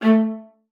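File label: A3, viola spiccato; Xsample VOL-5; 90 s Sample CDs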